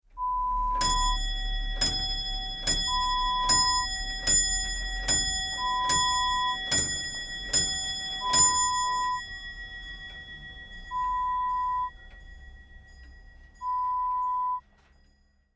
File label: Grandfather Clock sound